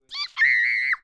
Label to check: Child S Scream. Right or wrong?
Right